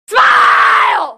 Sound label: Yelling